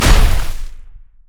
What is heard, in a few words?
ravage strike; nautilus